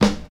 fresh clean steel snare drum g sharp key, Royality free snare drum tuned to the G# note